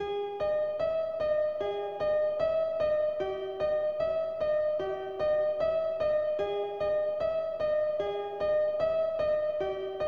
music outro; burgerandfrights

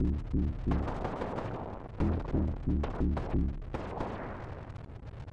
event soldier attack; adarkroom

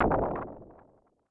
asteroid hit; A Dark Room

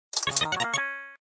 Mini Lock Open; bobtherobber2

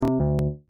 game Over; watermelongame

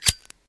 Lighter; fridaynightfunkin; weekend1; Darnell

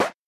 undo; Friday Night Funkin; charting Sounds

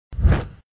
Plate Down; Bob the Robber 2

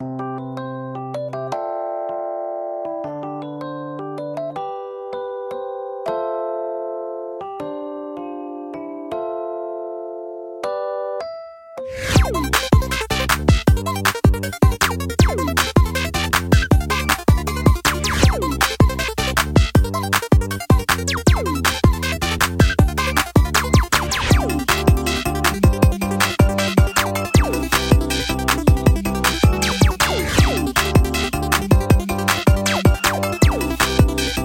Inst; fridaynightfunkin

